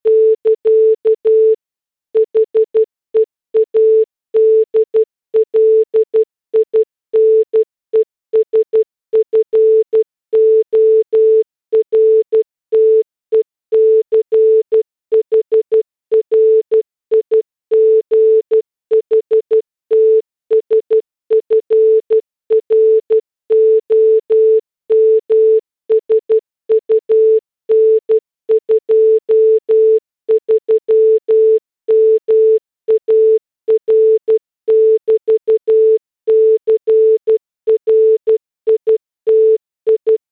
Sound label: morse headlines; tuxmachines